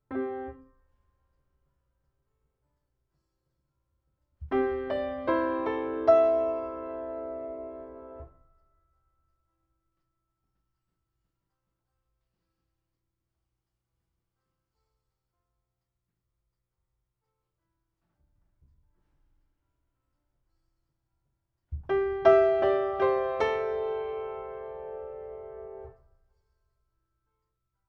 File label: Piano Ribbon